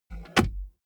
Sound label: car bmw e46 interior center console close slide